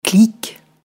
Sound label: clic